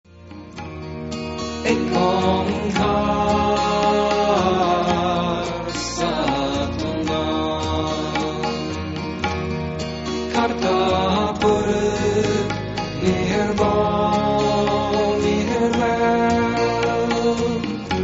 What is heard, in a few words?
Mool Mantra